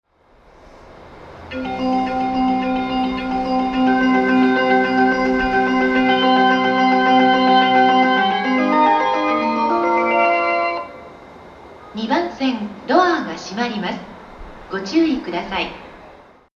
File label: gotanda melody, Tokyo Train Tunes; japan